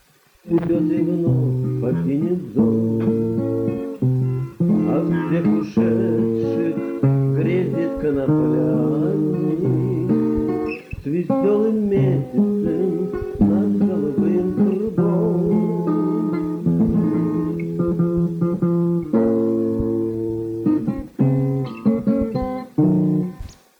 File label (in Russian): Stih pod gitaru